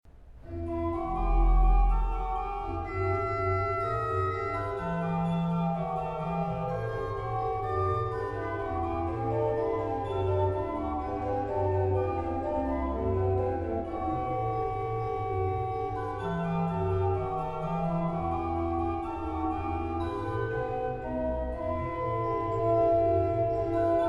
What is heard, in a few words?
Chorale Preludes; JS Bach; Auf meinen lieben Gott BWV 744